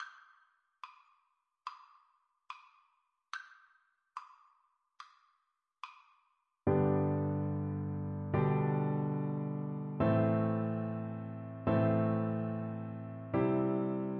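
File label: VLC karaoke, Cello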